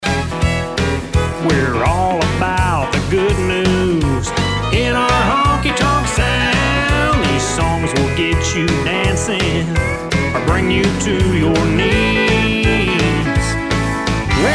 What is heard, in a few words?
Ringtone